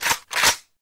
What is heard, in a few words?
revitalizer switch; weapons